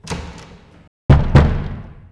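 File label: knock door; klep door; Mafie 1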